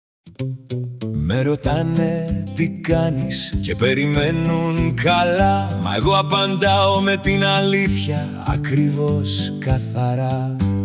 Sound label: how are you campfire acoustic; key into greek book 1